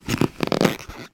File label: foley rubber object movement; sound effects one